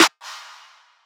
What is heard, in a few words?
Snares